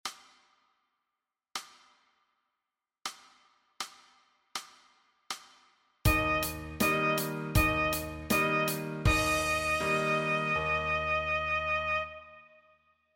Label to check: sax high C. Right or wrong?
Right